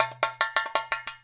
FILL, Percussion

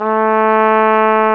Flugelhorn; Roland L-CDX-03 Disk 2

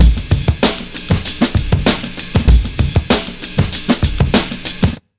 97 BEAT, Underground Hip Hop

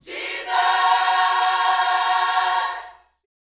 Vocal, Gospel, PHRASE; Spectrasonics

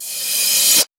Reverse Cym, Cymbals

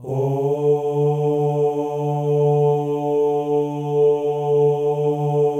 male ohs; Spectrasonics; Symphony of Voices

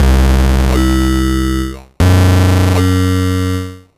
Sh09 Bass Loop, 120BPM

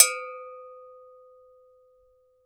GANKOKWE, African Perc

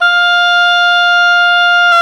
oboe; Roland LCDP04 Orchestral Winds; Oboe Brth 1

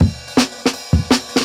Jungle Breaks

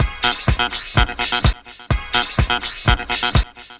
lop 126bpm, House Instr and Stab Loops

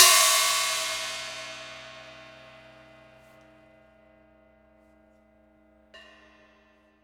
Prepared Drums

